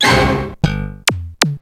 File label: Akai XR-10 Misc